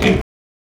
Pigs Pos

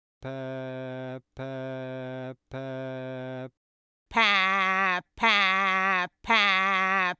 Non pressed Pressed

